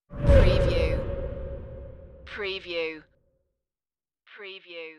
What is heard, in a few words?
Wipe